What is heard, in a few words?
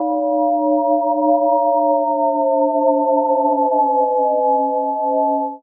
UFO Landing; Cymatics - Astro FX 10